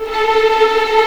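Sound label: A4 TREM P VL, violins tremolo; East West; The Ultimate String Collection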